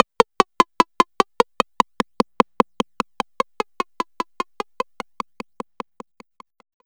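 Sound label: rim processed, rims, fresh disco house; USB Soundscan vol.20; AKAI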